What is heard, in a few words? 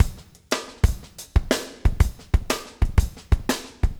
motown 120, loops 120bpm